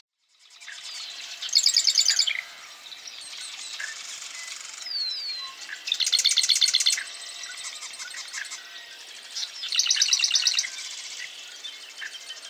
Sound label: Nebraska Eastern male at Pony Lake, Marsh Wrens; Donald Kroodsma; Normal songs; eastern males recorded in Nebraska nested side by side on adjacent territories